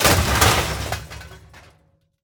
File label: metal break